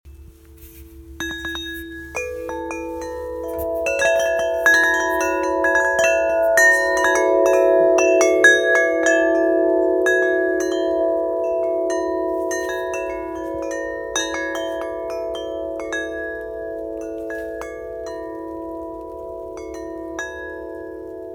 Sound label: chimes